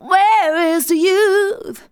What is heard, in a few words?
vox, Techno Trance; Ueberschall